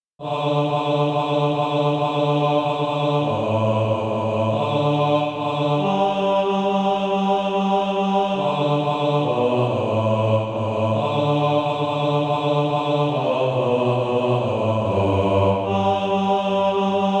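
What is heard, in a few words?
bass; Hewitt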